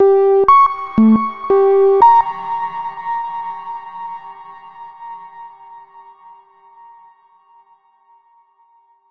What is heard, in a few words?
Corruptmelody 150bpm 44 Robot G3 Dist; Planet of Lana